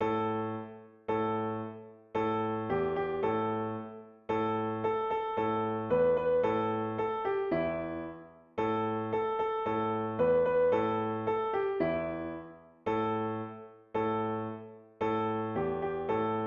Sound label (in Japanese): ピアノ